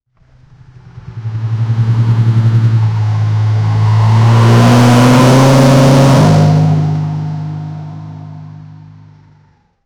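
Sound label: Royal Enfield Classic loud, motorcycle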